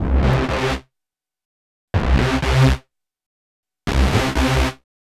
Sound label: Rave, Pads; Ruan; KJ Sawka - Drum n Bass & Beyond